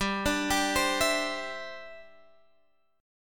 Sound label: E Minor 7th Sharp 5th G